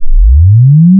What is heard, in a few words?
chirp